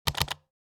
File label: Mechanical keyboard keystroke pressing multiple